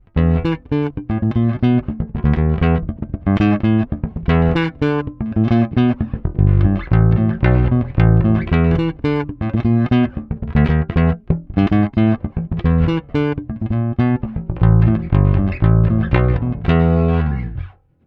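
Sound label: PJ Neck dry, bass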